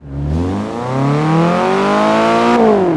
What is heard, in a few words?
first, vehicles